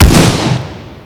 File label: flak 88 fire, weapons; Spearhead Demo; MacFormat 2003 June